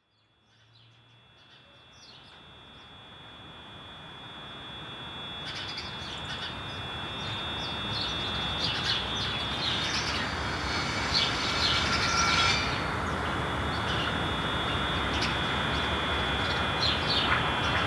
normalized back alley ambiance

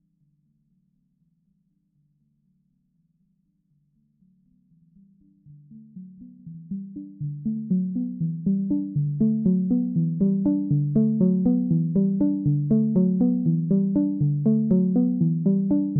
Unprocessed Evolving Arpeggio in Seven

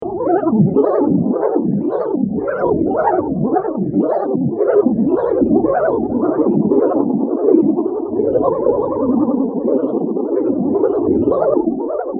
wave electric piano pattern

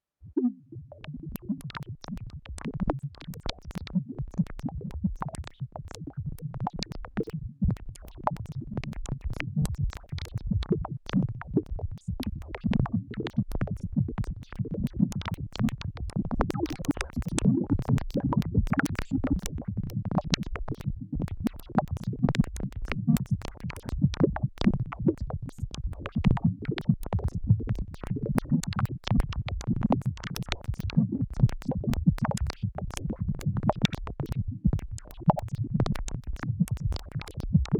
Crackly Analogue Synth Effect

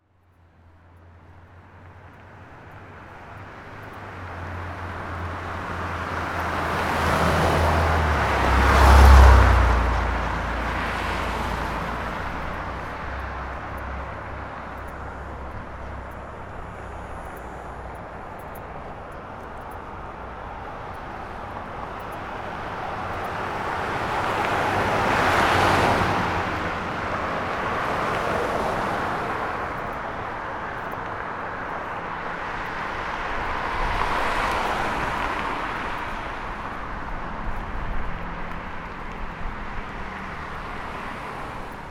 traffic

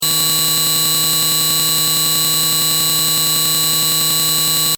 sound card glitch

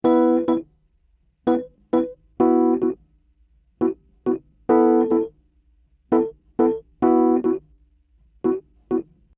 more rhodes; nublu

